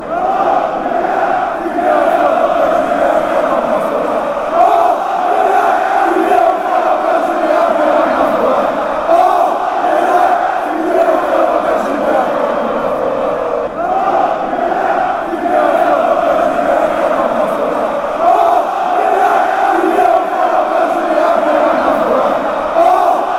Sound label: fanchants, greece; go pao